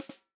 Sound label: snare drum, HIPHOP SNARE CHROMATIC; ProSamples vol.43 - Real Drum Kits